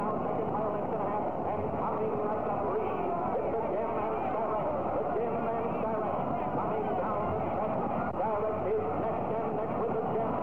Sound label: Crowd Noise, Horse Track